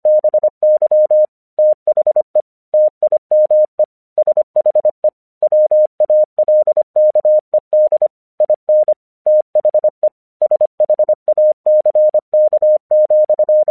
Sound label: English 25wpm